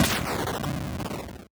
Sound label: medium, explosions